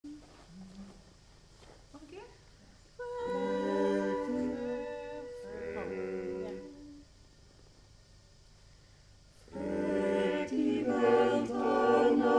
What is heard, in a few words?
kasche no piano; Kasche zonder piano; Jiddish ensemble TOCH